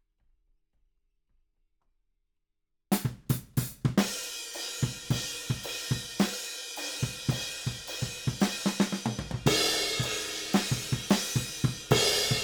Ride

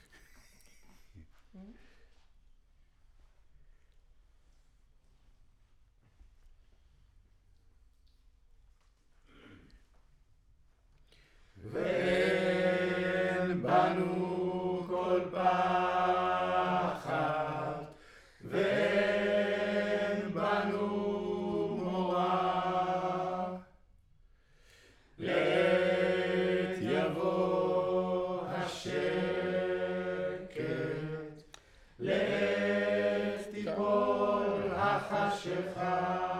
Choir

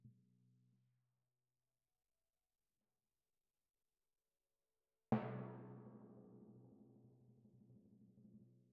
Timp Close

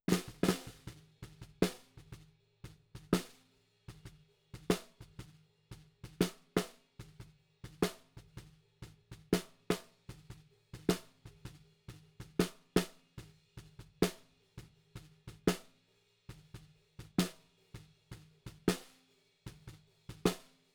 SNR B

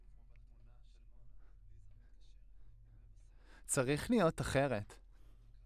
Voc OD

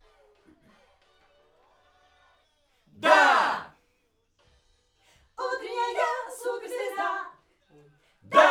Backs AKG

